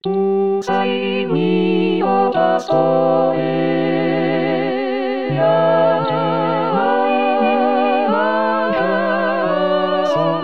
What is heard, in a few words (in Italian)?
Rit Coro; anno liturgico